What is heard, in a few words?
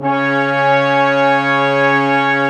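brass section, large ensemble long; Roland LCDP06 Brass Sections; Lrg.Ensemble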